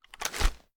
cloth; tacz; daffas arsenal

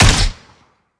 sniper, supressor; tacz; daffas arsenal

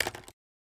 sg550 rattle; tacz; daffas arsenal

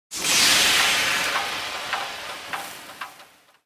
mg3 heat; tacz; daffas arsenal

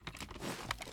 start reload tactical, mg3; tacz; daffas arsenal

